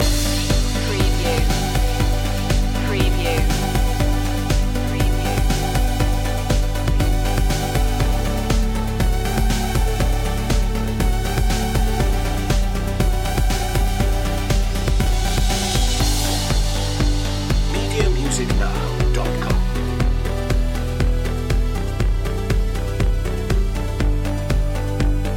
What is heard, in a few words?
uplifting light